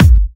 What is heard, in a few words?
Bassdrum; Vengeance Samples; VEE Bassdrums